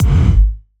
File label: Kick, Gated Reverb; creative gate; musicradar